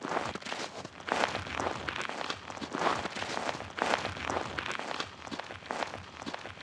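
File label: dirt drive; Austin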